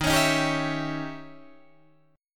Eb 7th Flat 9th Eb, Guitar Open D

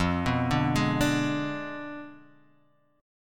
F Diminished 7th F down; Fdim7 arpeggiated; JGuitar

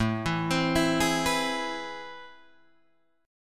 A Minor Major 9th, down, Guitar, Drop D

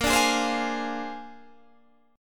A sharp 9th A sharp, Guitar Open D

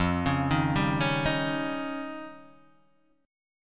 F 7th Suspended 2nd Sharp 5th F Guitar Open D

